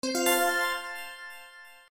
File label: bonus